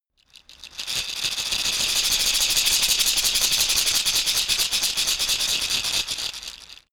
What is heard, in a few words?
shaker wood rough rattle dynamic